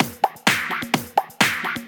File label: FX Loop 128 BPM, House